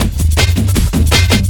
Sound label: LOOP L, Drum & Bass 160 BPM; Ueberschall; Drum & Bass Flash Trackz